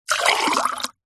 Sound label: food drink water pour into glass fast